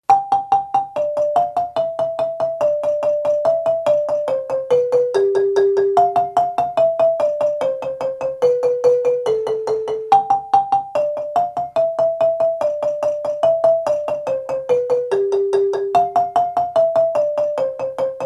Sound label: lead descending rolling, marimba; kare mugomba